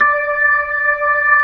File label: B-3, Jazz